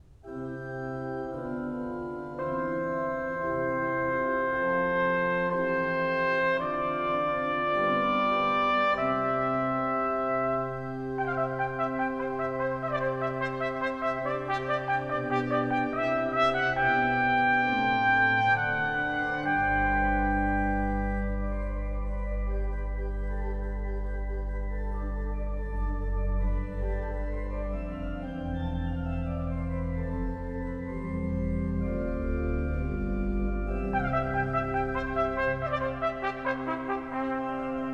Musike, Symphony, trumpet and organ; King Arthur

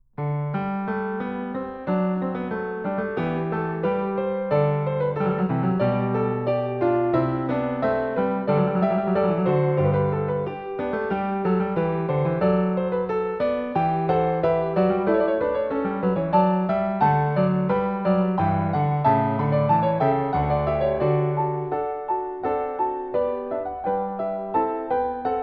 Clav. (Binaural); Goldberg Variations, BWV 988 Variation 30 a 1; Quodlibet; Bach

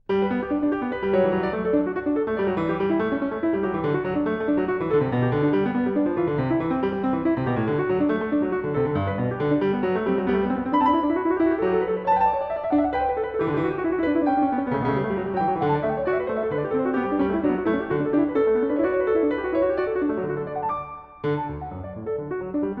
Goldberg Variations, Variation 20 a 2 Clav.; Bach; Zenph Re-performance; BWV 988

